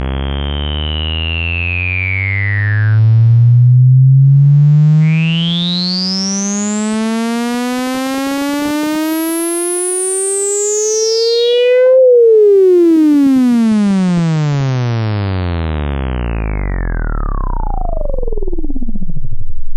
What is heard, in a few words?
CUTOFF RES SAW